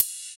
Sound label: Ride; Equipped Music; Loungin House